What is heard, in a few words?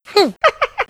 hunter stunned; huggy wuggy snpc; elmo